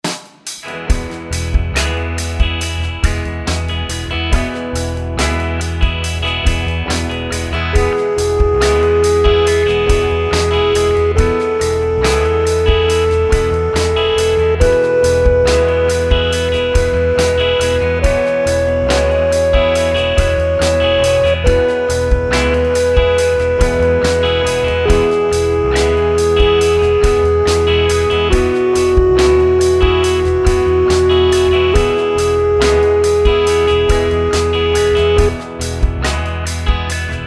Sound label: scale degree